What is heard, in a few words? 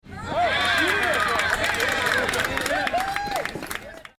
small sports crowd